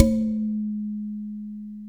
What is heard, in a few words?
WaveDrum C5, Drum Machines